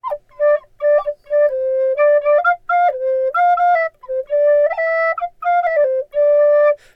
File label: Flute Player Melody